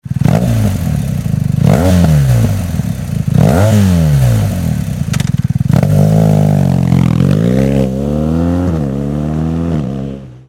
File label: hexa cone slip on exhaust bmw r1200 gs r1250 gs adventure; remus